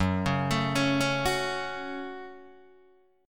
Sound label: F sharp Minor F sharp, Guitar DADGAD